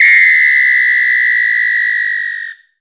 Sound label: WHISTLE LO, Drums & Perc; AKAI S6000 CD-ROM - Ultimate